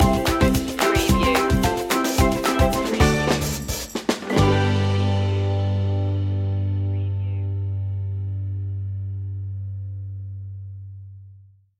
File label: color fun stinger